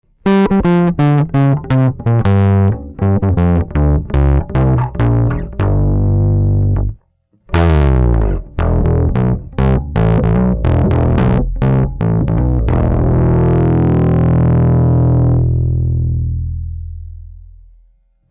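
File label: bass distortion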